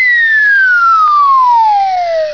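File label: slide down; EFFECTS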